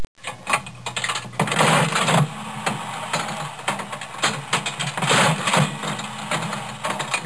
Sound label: Slot Machine